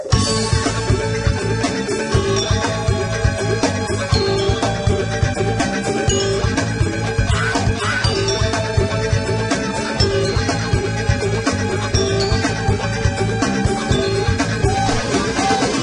Ringtone

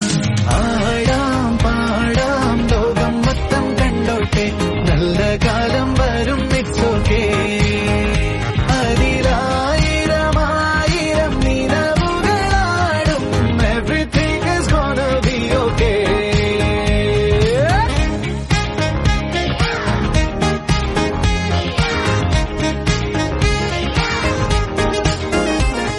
Malayalam Song; Madhuram

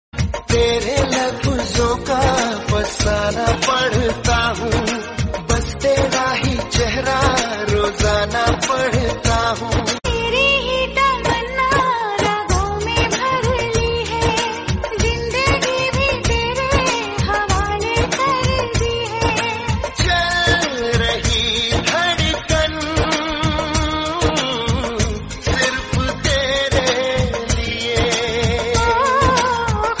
Hindi Romantic Bollywood, ringtone

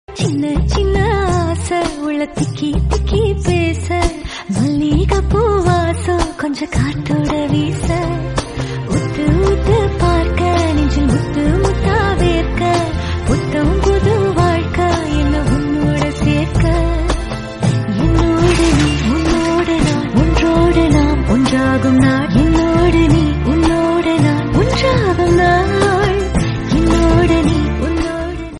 Tamil Song

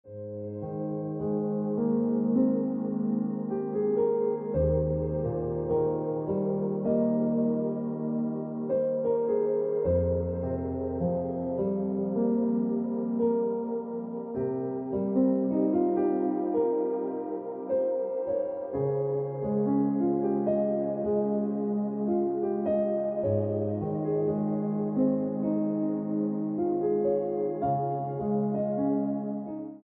Piano Music

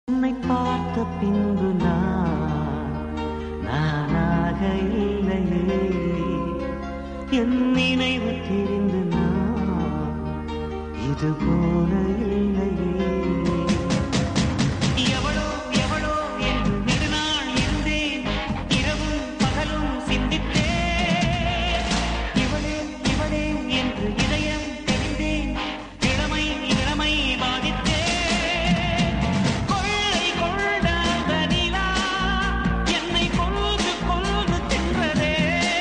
Tamil Song Ringtone

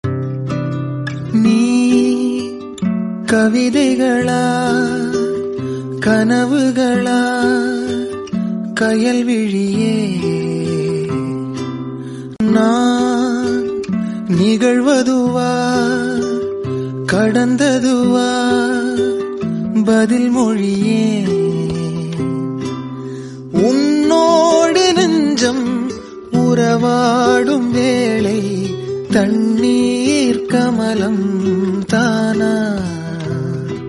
Tamil Song

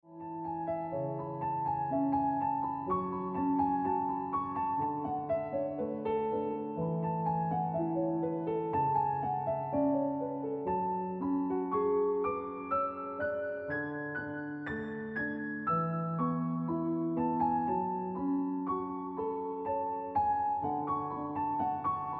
Ringtone Piano Music

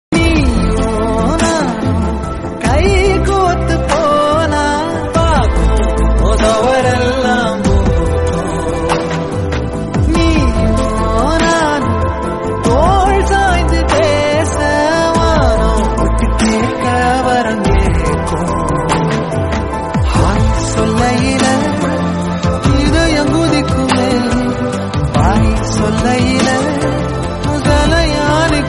Bgm Tamil; D Block

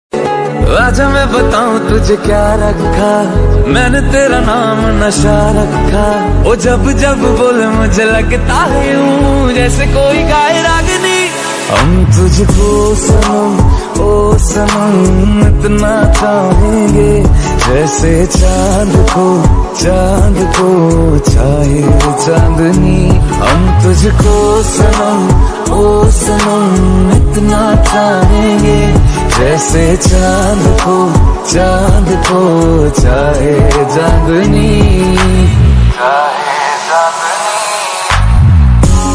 Hindi Song Ringtone

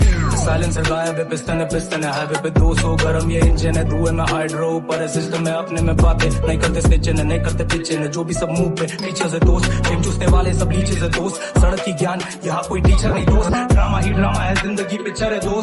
Hindi Rap Song

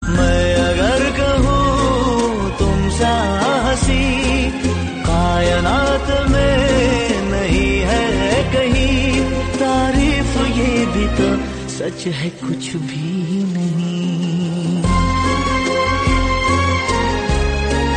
Romantic Hindi

